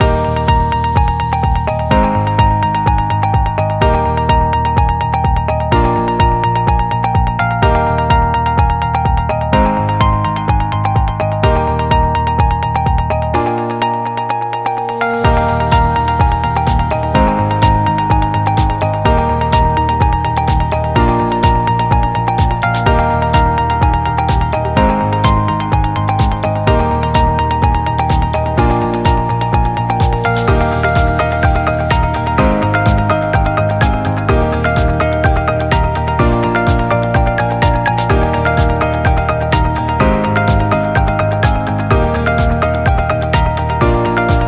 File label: Medical Loop